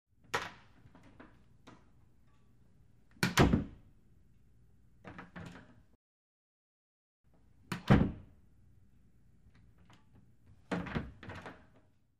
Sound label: Wooden door opens, closes